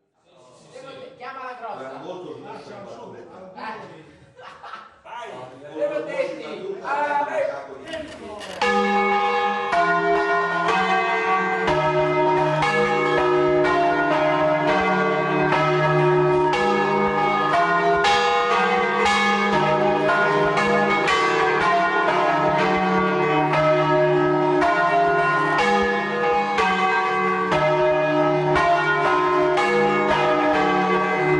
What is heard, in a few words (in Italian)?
Campane e campanari DOPPIO RIBATTUTO; Pieve